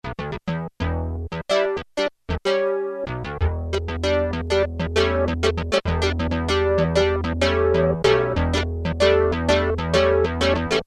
Funky Clav, Roland JX-3P

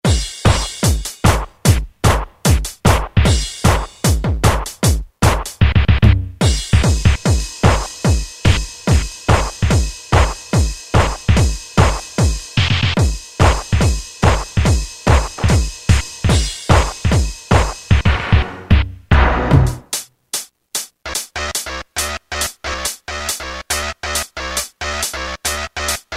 Rave Set, Roland M-DC1, Rhythm Sets

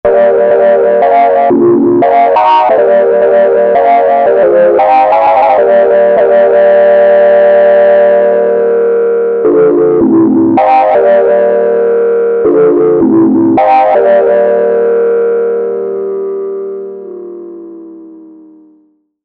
Fake 3 OSC

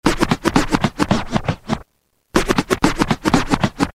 scratch x4; Roland M-DC1

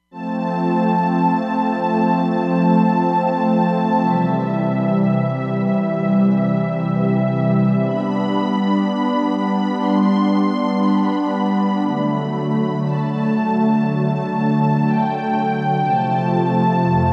JP Strings, Roland Fantom XR

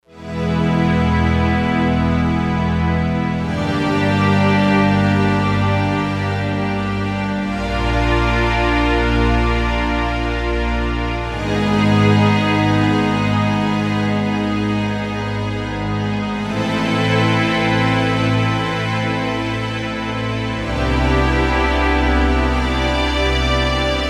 Soft Swell, synth

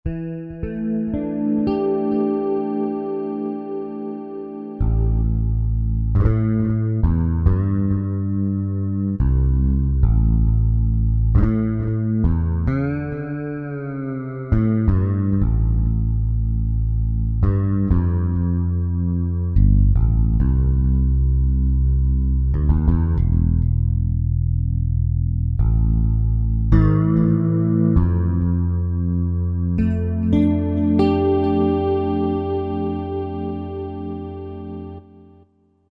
JP Chorus Bs; Roland SR-JV80-10 Bass & Drums

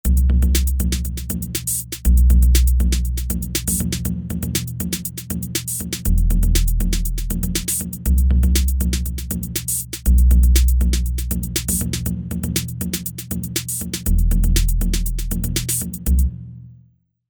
Jungle

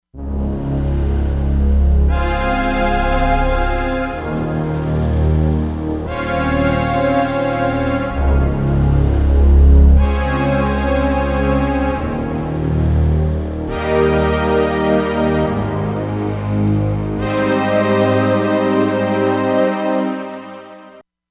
Analog Orch, Korg MSC-07S Synth; Korg MSC-07S Synth 2

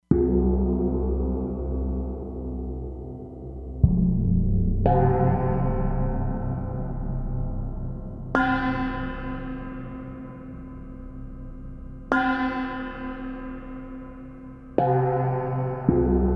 Temple Gong, E-mu Proteus-3; Factory patches

